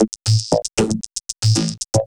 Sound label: Processed Perc; Sonic Foundry (Sony Creative Software); Electrocution; Beat Components